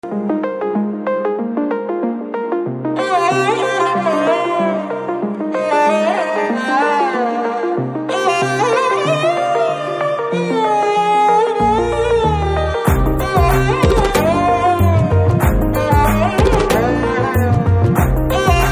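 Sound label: background music, Telugu Ringtone; Alludu Adhurs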